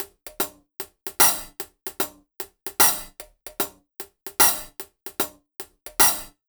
HH, Ragga & Dub Reggae; USB Soundscan vol.27; AKAI

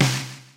Snare, Yamaha PTX8

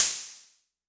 Hi Hats Open; LIMAT Div Dept

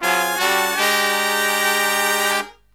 Killer Brass Riffs

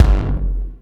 bass drum; Zero-G - Chemical Beats; BASSDRUM ZOO

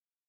Rest loop; SNES; Terranigma

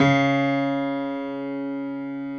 PF STB C#2, GRAND PF

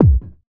hard bass drumz

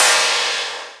Cym2, cymbal

